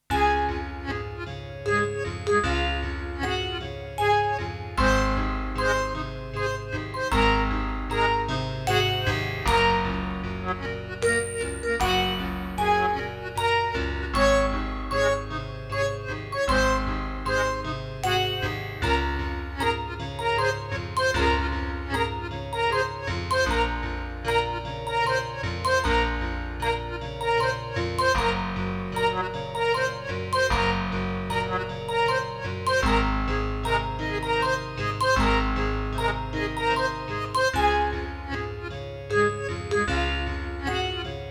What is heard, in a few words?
minimal